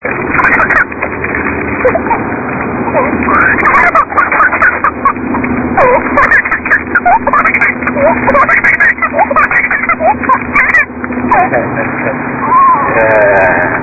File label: giggling